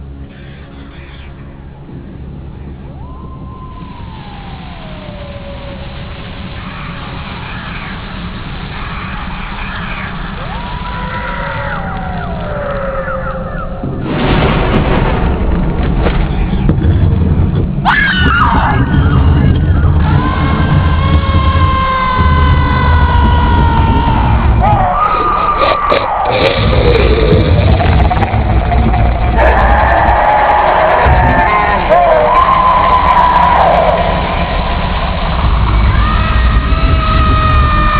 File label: screams snarls; openffa; dungeon